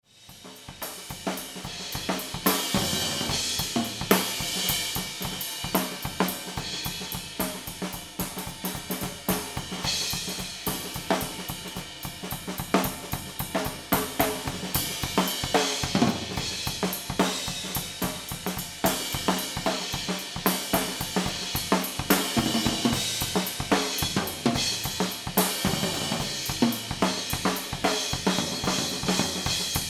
Drums Kit Mic

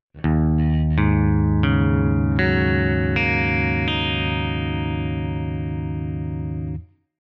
guitar Half Down

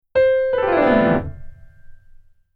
Keyboard Gliss

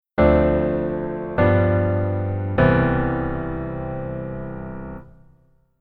keyboard voicing low

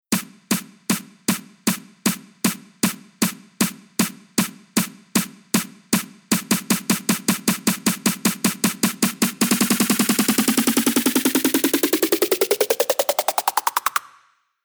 FutureBass Structure Snare Roll

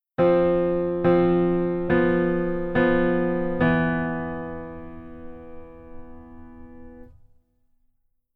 Keyboard Voicing Rootless Pf Only

